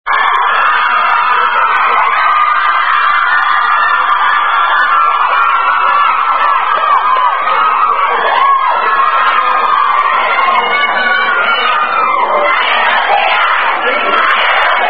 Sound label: Pi Beta Phi Singing, Unknown Pi Phi Sorority Chant